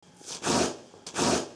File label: Sub ripping